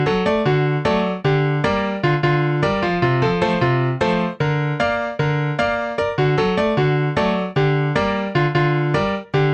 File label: PIANO; multitracks